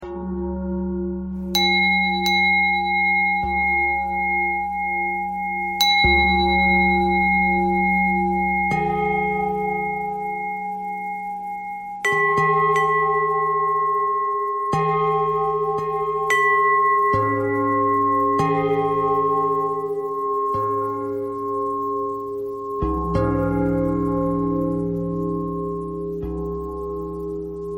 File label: bell thread pentatonic